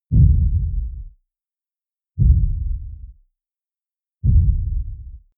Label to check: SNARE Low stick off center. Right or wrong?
Wrong